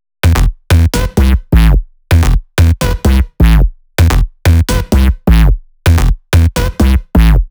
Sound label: Melody Kits 128BPM Root E; Vengeance Samples